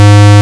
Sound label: JX SQR; INIS; Vintage